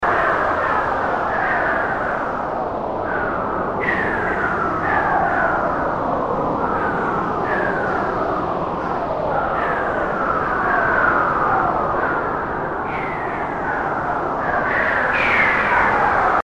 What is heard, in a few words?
Alien Atmosphere Looping